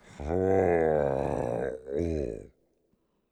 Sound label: getting dizzy; bumbino